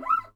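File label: D5, classic guitar; Northstar - New Gold VOL-2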